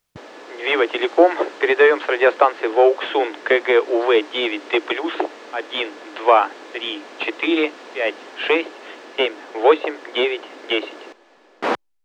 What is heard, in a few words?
wouxun kg uv9d plus tx